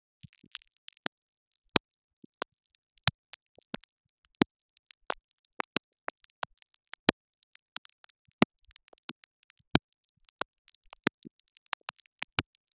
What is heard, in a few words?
Bad Noise Level